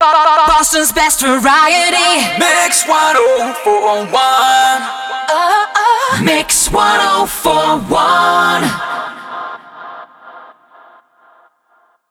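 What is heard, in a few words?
125bpm f MIX, jingle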